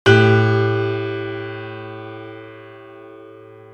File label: dissonance